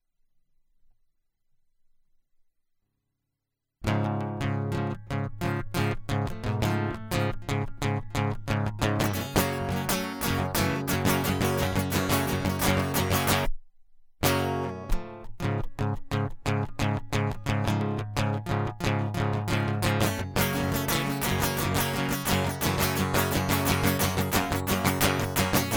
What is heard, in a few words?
Guitar Loop